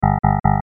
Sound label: warning